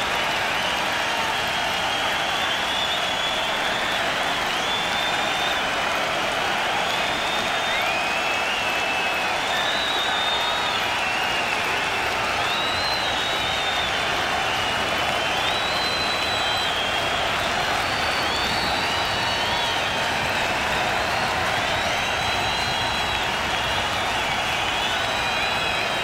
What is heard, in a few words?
Freespin Ambience; netent